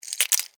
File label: Insect, Claw, Arm, Reach, Snap, Movement, Bug; Insect Claws; Sound Effects:Animals:Insects; Blastwave